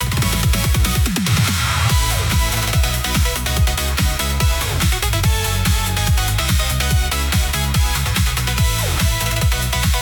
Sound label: loop